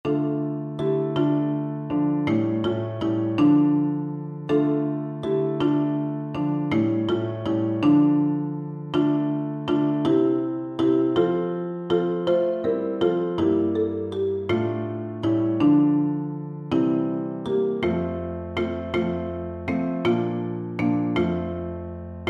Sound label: MB